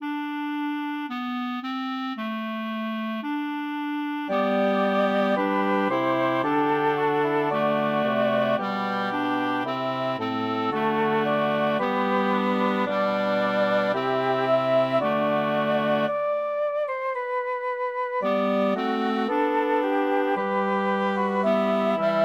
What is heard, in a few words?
ave maria for woodwind quartet